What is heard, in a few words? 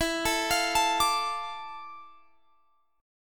D Minor E, chord; Dm/E arpeggiated